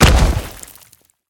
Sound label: dirt fall; Golden Crayon Sounds